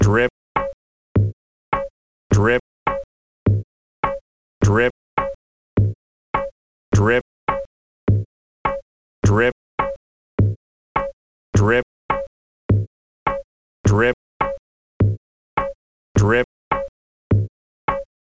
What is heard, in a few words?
drip loop